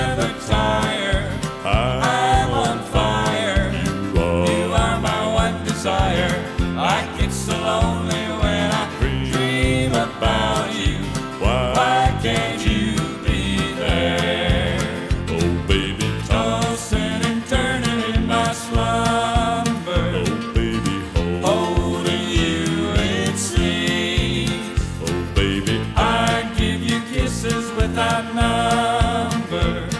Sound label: country